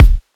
huge kick sample d key, kick single shot tuned to the D note. Loudest frequency: 280Hz